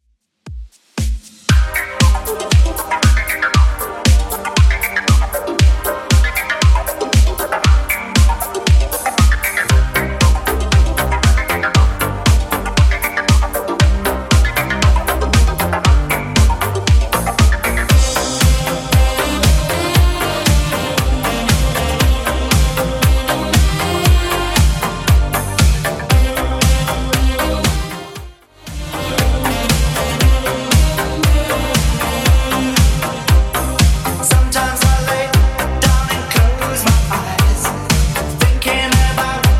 80s 117bpm; Redrum

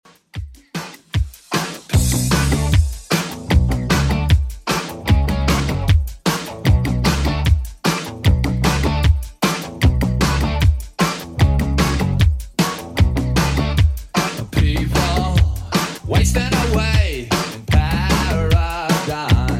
80s Redrum 152bpm

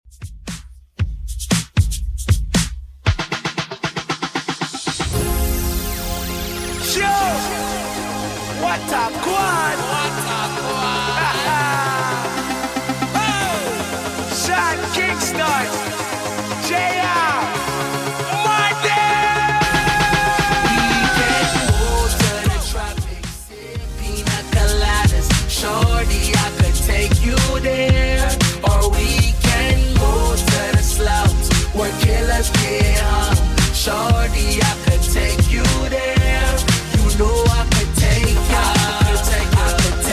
116 bpm; Redrum

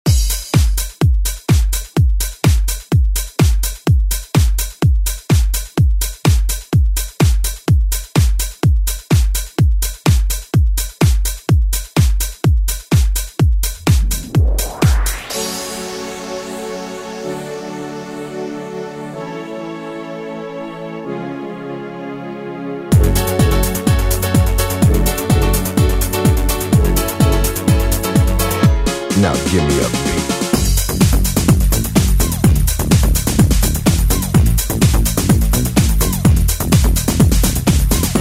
ReDrum; DJ Mhark

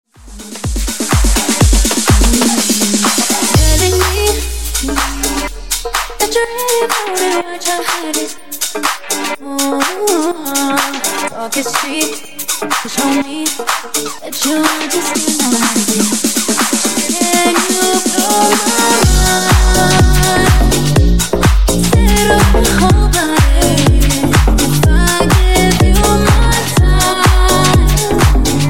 Disko Funk Mash Up 124bpm

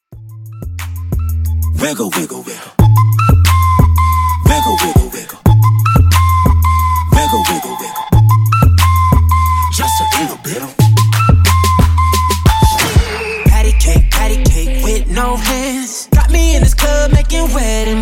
Funk Wordplay Dirty 90 127 Bpm

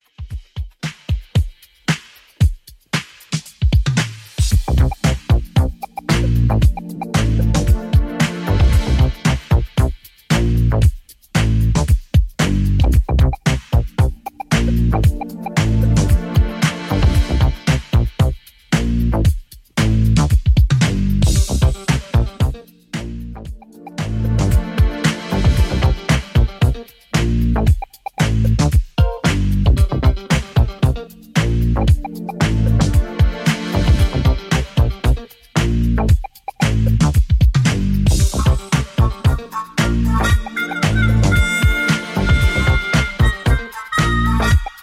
80s Redrum, 114 bpm

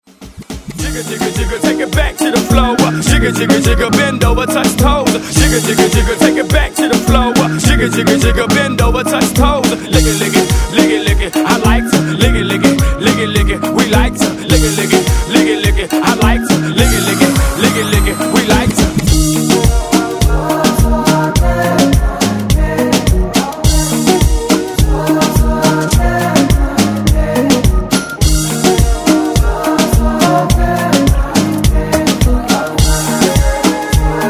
Moombahton Hype Redrum; remixplanet

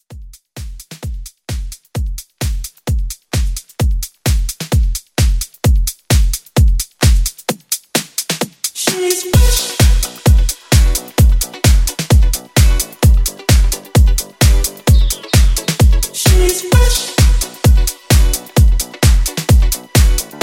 Fresh House 130

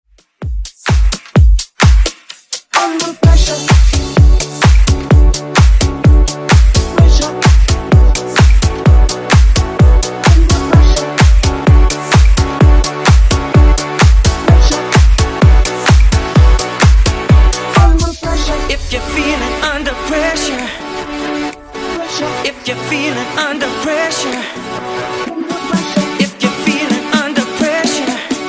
Funk Bootleg 128Bpm

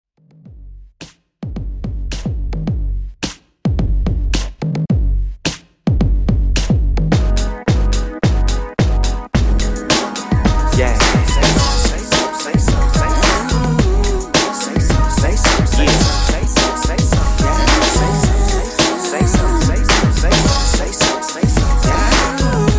Bass Hip Hop Old Skool, 108 Bpm

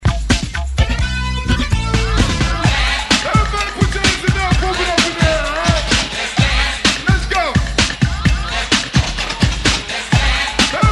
70s Hype Re Drum 128Bpm; DJDOLLS

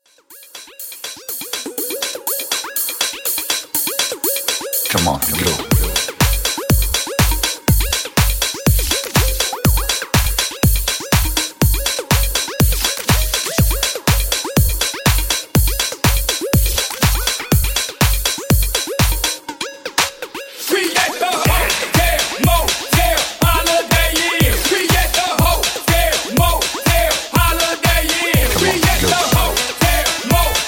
House Mashup 122Bpm

